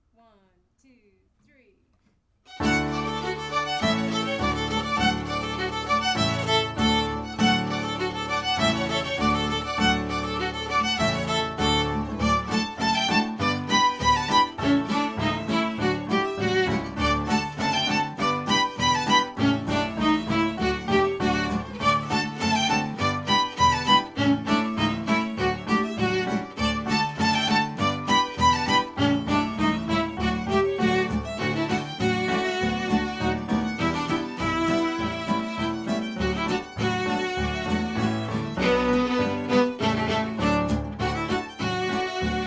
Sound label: fiddle; Ronfleuse Gobeil